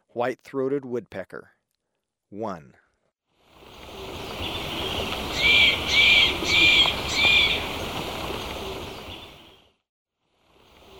White-throated Woodpecker, Birds of Ecuador; S. America

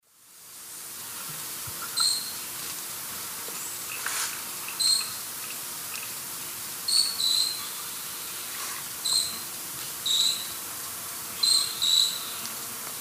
Myiornis ecaudatus, calls or song; S. America; Eastern Lowlands

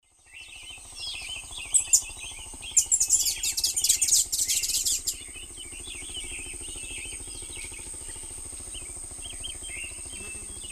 Thlypopsis sordida, song; S. America; Eastern Lowlands

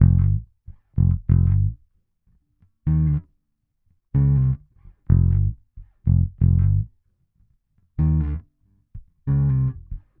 Bass Hip-Hop

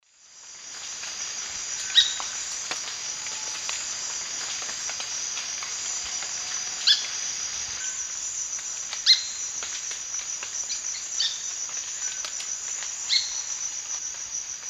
Platyrinchus saturatus, calls; S. America; Eastern Lowlands

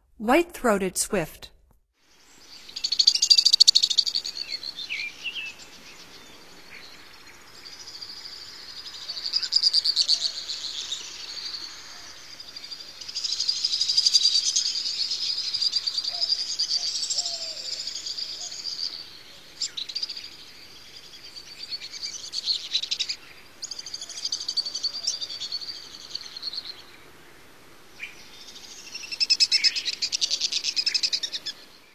White-throated Swift; North America; Stokes

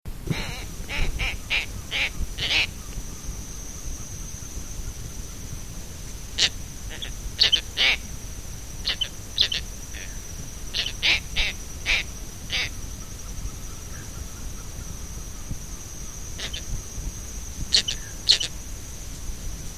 Scissor-tailed Nightjar, S. America

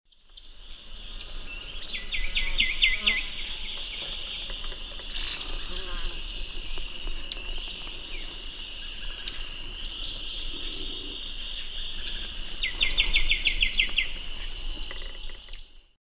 Pachyramphus polychopterus, song; S. America; Eastern Lowlands

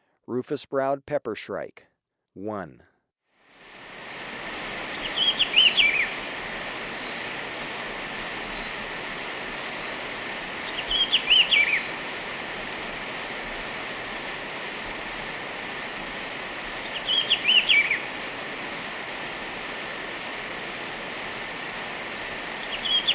Rufous-Browed Peppershrike, Birds of Ecuador; S. America

